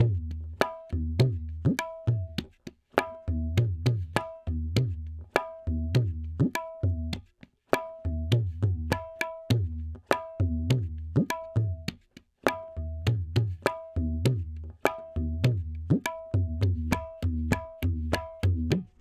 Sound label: tabla 101, Hip Hop India, 101bpm; Hip Hop India Modern Percussion; Apple Loops